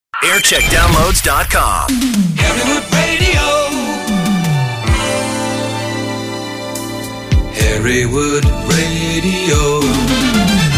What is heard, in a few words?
Jingle Montage; Hereward